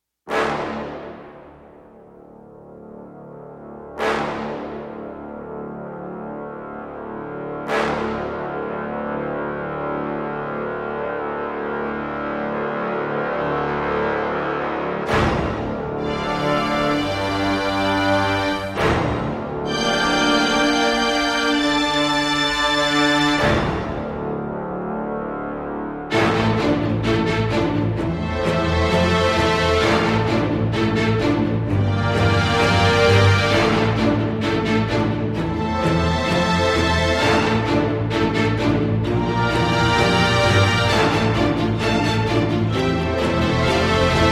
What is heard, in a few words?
orch only